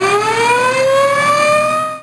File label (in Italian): sirena emergenza